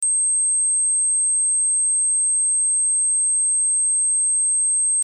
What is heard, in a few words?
high tone 8368hz